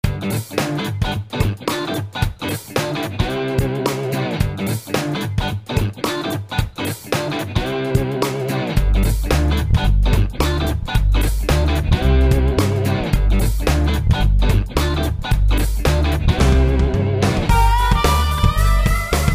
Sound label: Drum Tools